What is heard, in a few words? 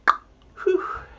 effect click